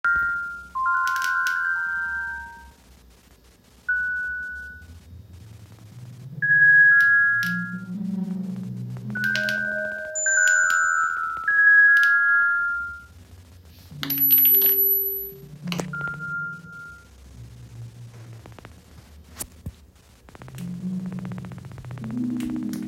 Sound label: Theremin